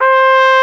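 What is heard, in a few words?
trumpet; Roland L-CDC-01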